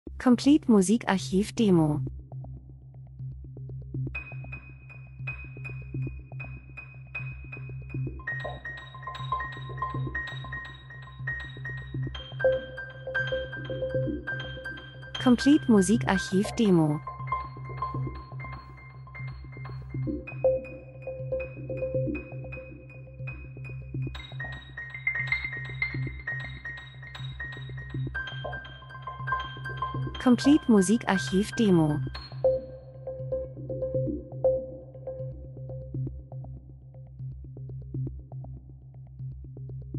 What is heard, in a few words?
drums; Percussion Elektro Komplexer Ablauf Maschine Produktion Urban Autofahrt Verkehr Akzent Industrie Dokumentation Lifestyle